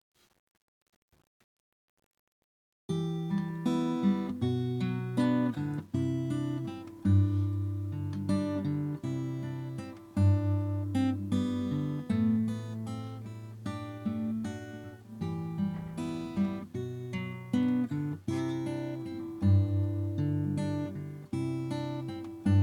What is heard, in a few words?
Wdding Song intro